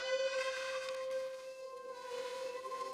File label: coin ding